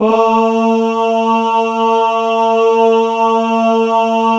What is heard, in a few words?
BAH B 2, Synclavier Strings; ILIO